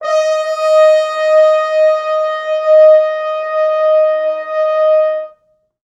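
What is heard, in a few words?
4 French Horns sustain; East West; Quantum Leap Horns Sax; 4 FrHorns SUS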